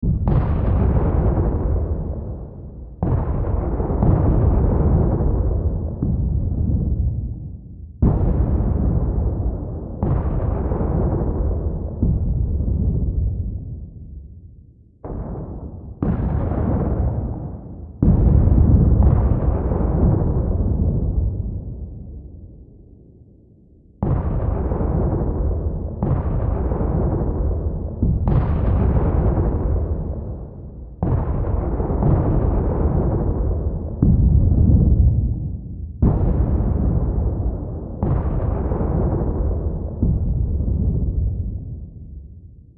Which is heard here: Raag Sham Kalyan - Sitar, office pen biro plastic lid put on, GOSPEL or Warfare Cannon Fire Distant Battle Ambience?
Warfare Cannon Fire Distant Battle Ambience